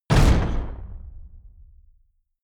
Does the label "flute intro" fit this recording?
No